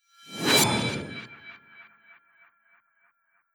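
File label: Attack Mamluk swoosh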